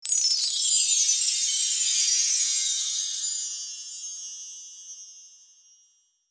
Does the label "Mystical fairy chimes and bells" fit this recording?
Yes